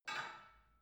barbell only lift up reverberant soft, fitnessstudio